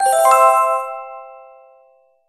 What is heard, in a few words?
level intro